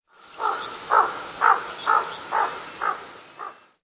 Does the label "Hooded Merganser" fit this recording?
Yes